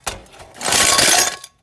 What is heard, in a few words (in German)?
metall rascheln